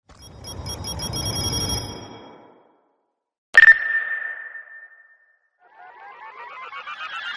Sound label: epic sax alert fade; 10 seconds